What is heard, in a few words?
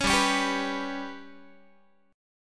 G Major Flat 5th Guitar, chord; GMb5; strummed